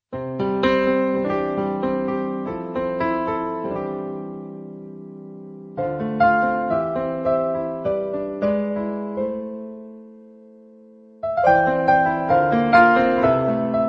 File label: twinkle reharmonised